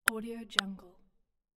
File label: switch button